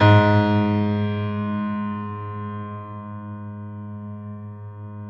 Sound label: PED FF, GRAND PED; Best Service Advanced Media Trax Modern Composer